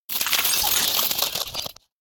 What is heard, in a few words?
Scarab Keychain Play